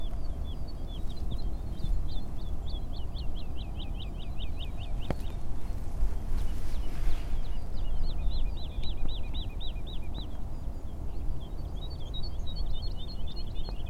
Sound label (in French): Alouette lulu